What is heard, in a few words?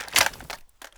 mag out, weapons lr300; garrysmod